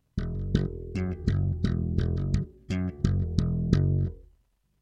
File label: pseudo slap, Basse